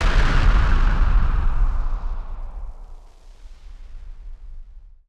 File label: Party Popper FX